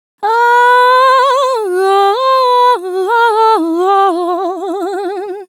Opera, ambient

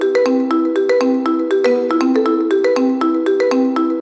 Marimba Arp; молоточки

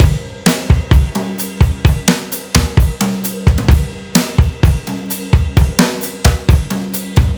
Brush; Latin (Латино)

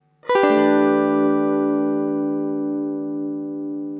Atmo, jazz

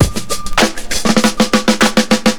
Clapper B, funk; сэмплы ударных

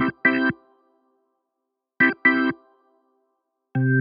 Short Org, rock alternative

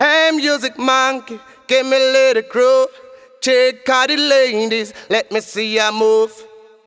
Hey Music; Dubstep

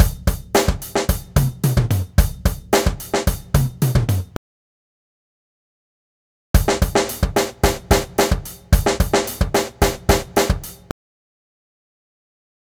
Funk Drums 110 BPM; Ударные (Drum Loops)

